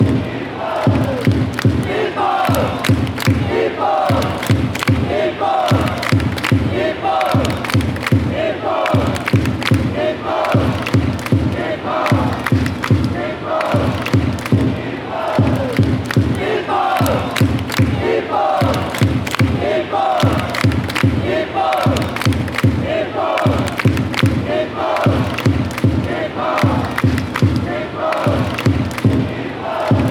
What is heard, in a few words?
japanese chant